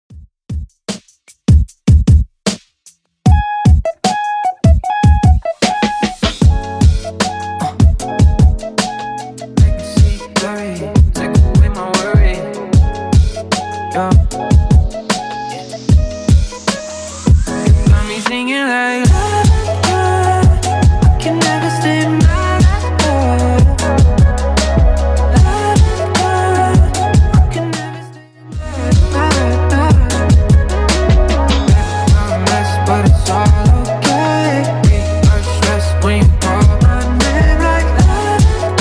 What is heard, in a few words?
Top40 Redrum 76bpm